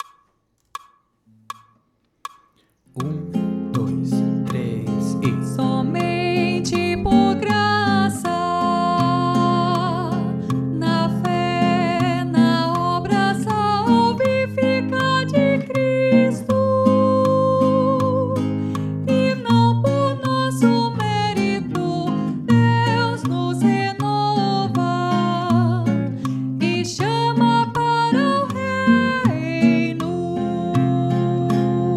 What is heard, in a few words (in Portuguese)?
Base soprano; Confessamos juntos